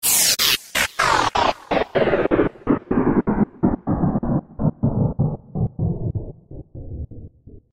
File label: Sms